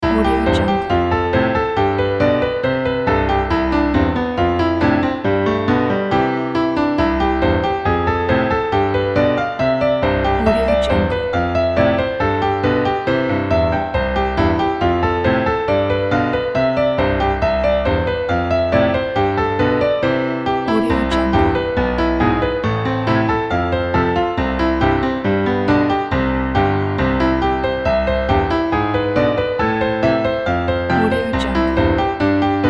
fun piano loop

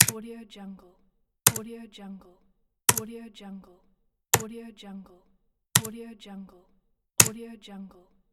keyboard button

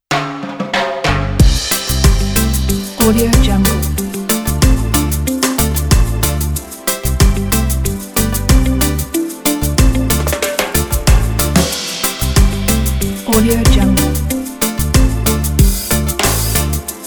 happy and sunny reggae

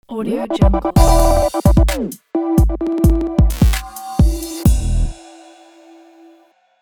glitch logo

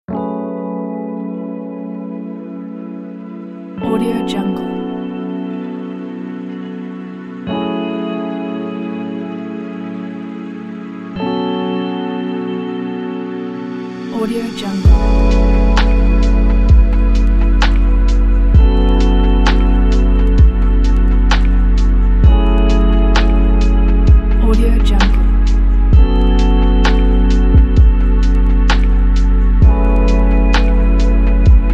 chill ambient

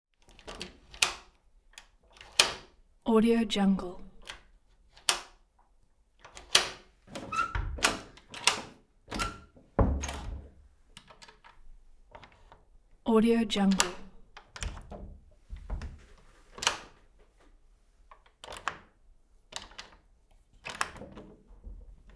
door latch open and close; prosoundfx

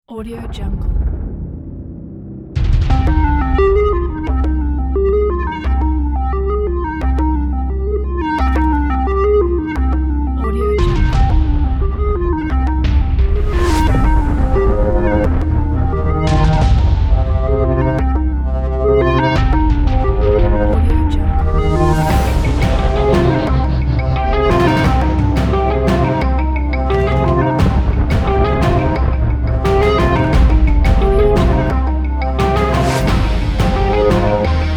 mysterious vintage

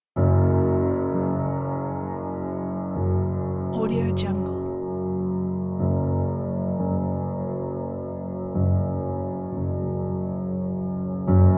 ambient

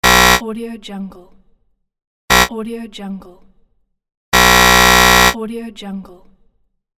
wrong answer

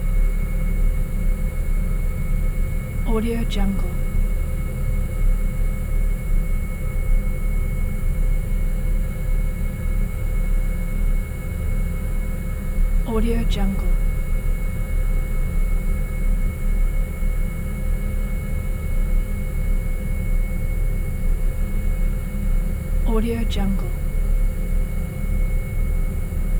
drones machinery futuristic tech; doom drones 2; soundmorph

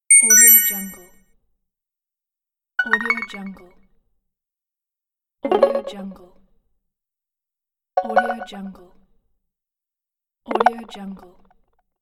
new item game sounds

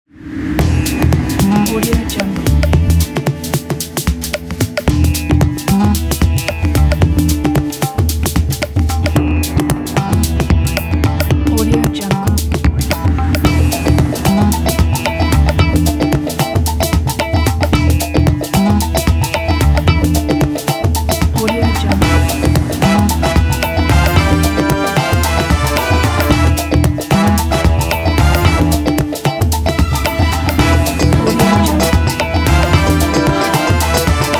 indian tv show opening

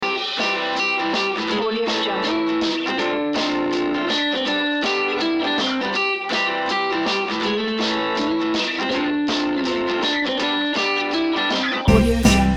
acoustic jazz; petrachesergiu

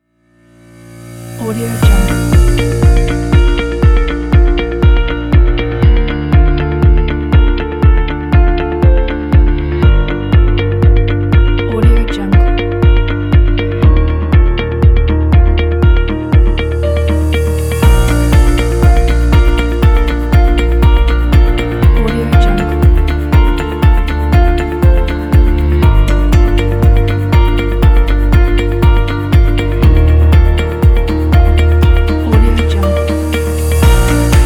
inspire corporate kit

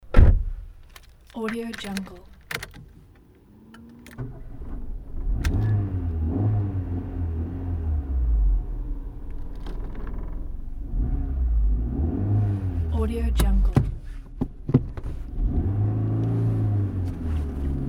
car starting and drive away interior; fxprosound

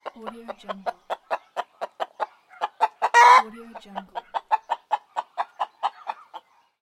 hen

